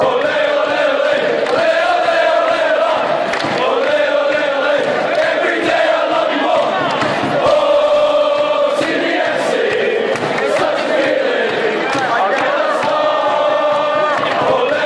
everyday i love you more, football songs and soccer chants from Australia